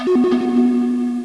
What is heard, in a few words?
Defeat Sound; Galactic Frontiers™ 1.0.2